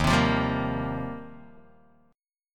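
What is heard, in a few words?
E flat 6th Add 9th E flat, down, Guitar, DADGAD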